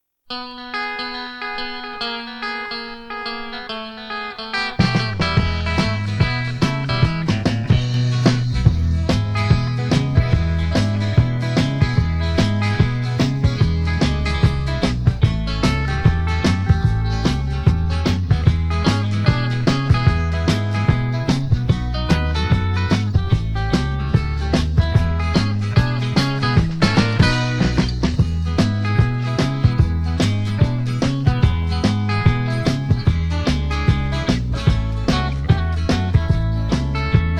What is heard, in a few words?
blues